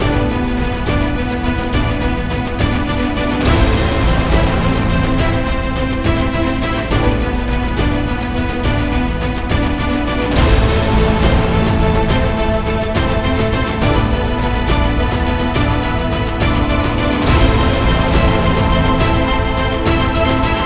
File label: cinematic; by burak sound bank; tension gerilim vol 54